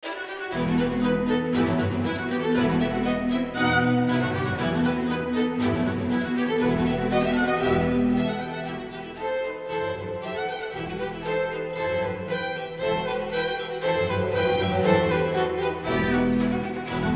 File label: Tambourin; Everything String